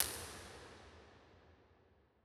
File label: Hybrid Real Places Transfiguration Basilica, Impulse Responses; Ableton Live 12 Lite